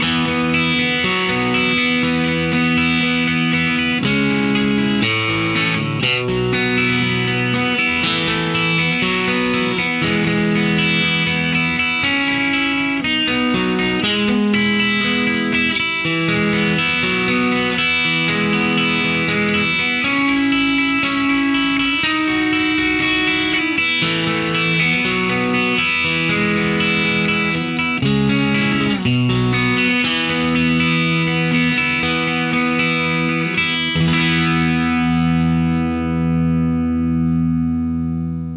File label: solo